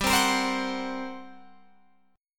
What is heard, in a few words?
G Minor Major 7th Double Flat 5th, G, Guitar, Open F; GmM7bb5; strummed